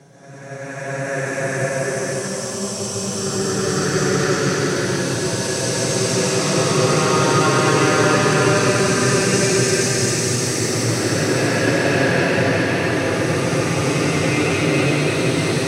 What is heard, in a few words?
Collision stretch